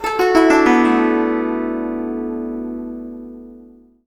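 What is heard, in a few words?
guzheng; Spectrasonics; Heart of Asia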